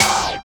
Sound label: SMEER CLAPS; drum; CLAPS+SNIPS